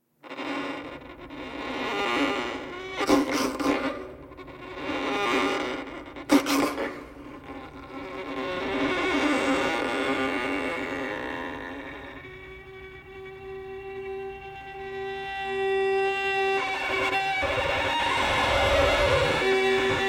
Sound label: with a ring modulator circuit; violinist